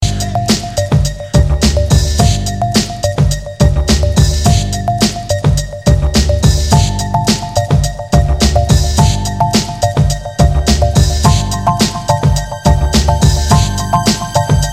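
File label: Sweet Rhythm Melody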